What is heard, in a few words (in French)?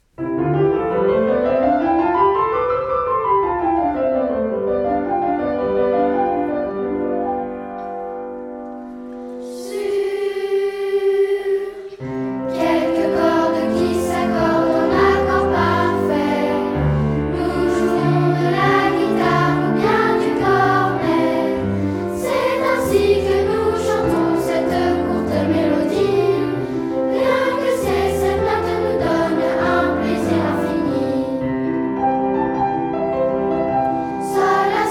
choeur d'enfants piano